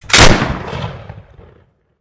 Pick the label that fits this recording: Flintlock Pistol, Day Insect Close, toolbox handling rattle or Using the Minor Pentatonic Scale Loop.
Flintlock Pistol